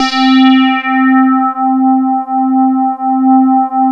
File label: PULS LEAD; MORPHEUS